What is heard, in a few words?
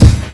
RAP KICK, Ensoniq ZR-76; 300 Drum Machines